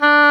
oboe, Roland

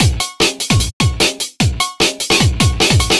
150 Fill, Drum Loops; Zero-G; Soundclash Nu Dance Electro; Acidized Wavs; 150bpm; Cabin Fever

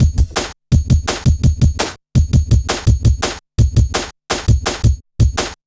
Drum Loops, New Wave 167.5; Zero-G Jungle Warfare 1, 2 & 3; 90 s Sample CDs

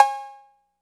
TR-808 Cowbell; Roland BK-5; Percussion

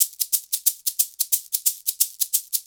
90 PERC

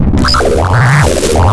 disto fx, big beat; Big Beat Samples & More; AKAI; DISTOFX KIT

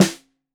Snare 65 Reg Stereo, Deeply Sampled Drums

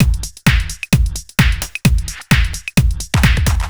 130 bpm, drum loops; Zero-G; Soundclash Nu Dance Electro; Acidized Wavs; Driller Killer

